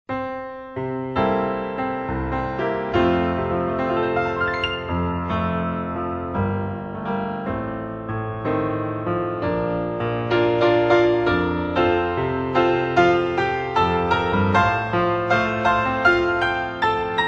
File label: Keyboard